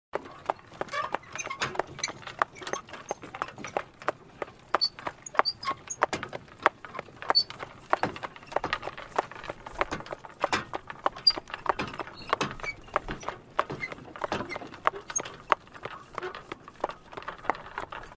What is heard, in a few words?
horse carriage; کالسکه اسب